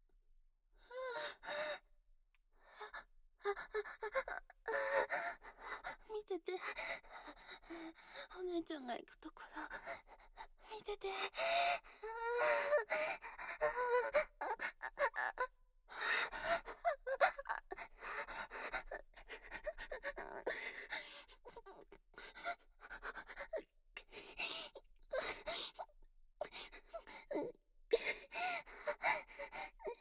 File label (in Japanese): お姉ちゃんの オナニー ループ フィニッシュ